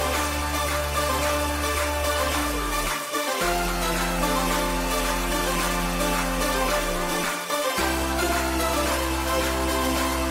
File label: music ringtone bollywood ringtones